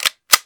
reload sound

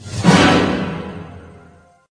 Power Down; rayman 3 hoodlum havoc gc ps2 windows xbox 2003

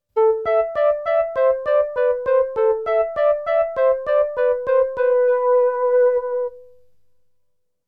Earthen Flute; Dinosaur

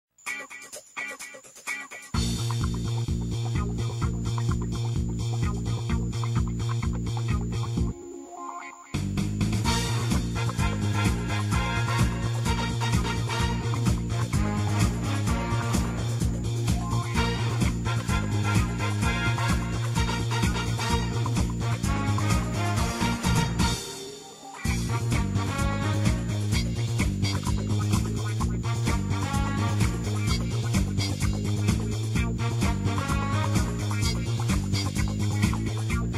Skating Music; Rocket Power: Beach Bandits; GameCube

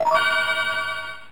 Coin; Chase H.Q.; Special Police S.C.I.; Saturn

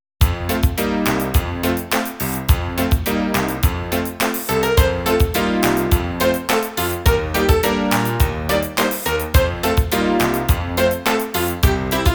Judging; Harvest Moon Back to Nature

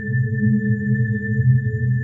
VAMPIRE PLAYER HOVER LOOP; PLAYERVAMPIRE; Dungeon Defenders Eternity

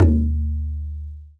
Lo Geh Tabla; Percussion; Scratch

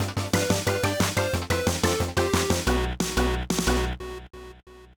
Level Fail; Moshi Monsters Katsuma Unleashed; NDS

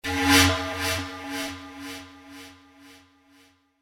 panflute

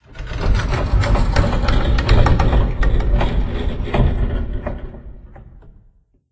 Metal Stress; silent hill homecoming 2008 gamerip pc ps3 x360

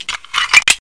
reload pistol Clip In

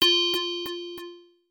Credit SFX; Mad Motor; arcade

